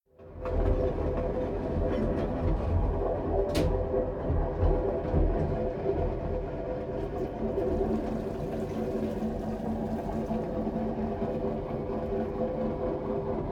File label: In the train lavatory; KMP Film Video Sound Effects